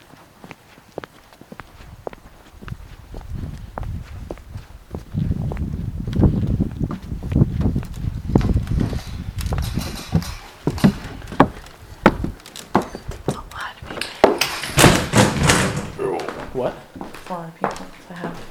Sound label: farmhouse groan; wells reserve at laudholm